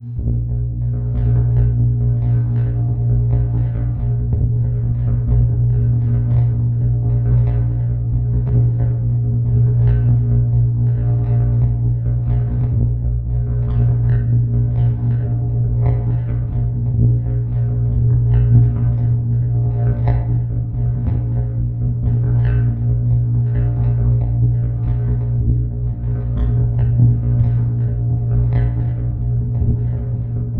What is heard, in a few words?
wah wah